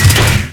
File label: Stop; Kaiten; Puyo Puyo Puzzle Pop; iOS, macOS, mobile